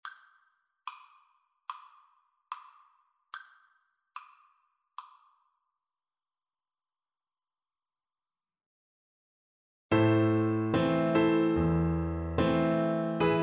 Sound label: aint gonna let flute